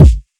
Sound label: Industry kicks